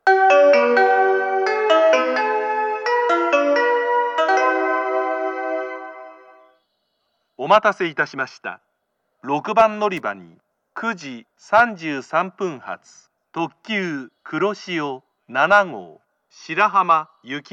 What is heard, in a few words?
limited express, kyoto station; kuroshio7; shirahama; jr-west